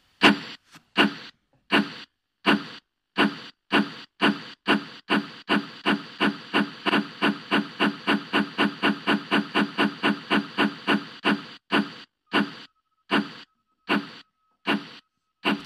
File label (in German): DAMPF FELDBAHN; Basic S Sounds